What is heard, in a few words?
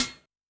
Snare, Yamaha DTXpress-II